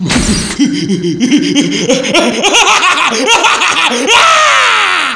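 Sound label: countdown, weapons; zaero; a2k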